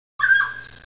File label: mountain call, quail